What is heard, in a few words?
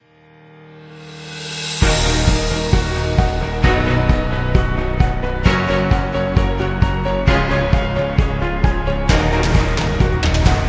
Epic Business